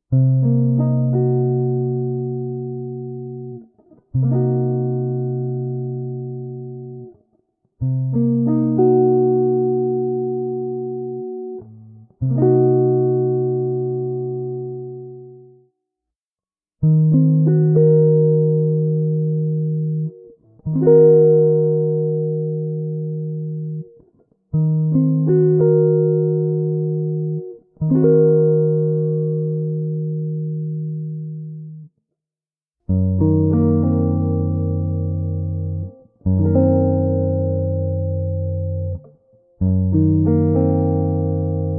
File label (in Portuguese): Inversão de mi7b5